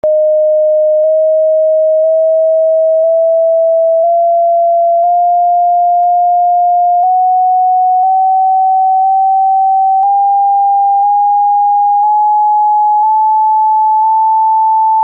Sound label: Soprani soli range 3 mixed ascendant scales 157+138+126 cents